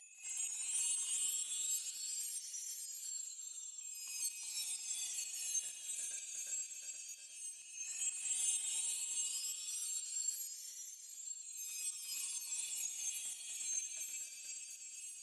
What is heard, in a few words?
Background Loop 126bpm